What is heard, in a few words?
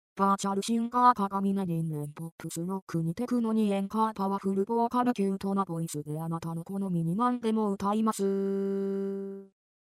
moody bass tone